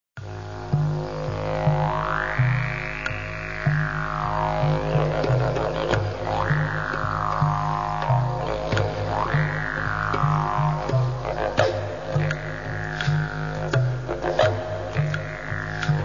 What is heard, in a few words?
Tribal Rhythm